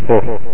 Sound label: laugh